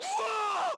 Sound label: Death Voice; Ion Shift